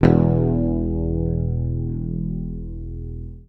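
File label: bass, E1, right channel; PICK; Sampleheads; Will Lee Bass Library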